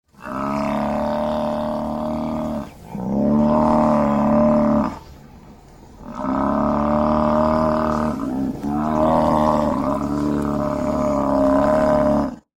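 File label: buffalo voice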